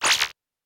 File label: Test Incorrect; Yu-Gi-Oh! Master Duel